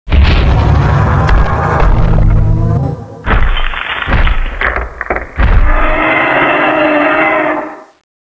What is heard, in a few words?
climb away; tubequeen